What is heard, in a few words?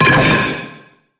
Drop Baton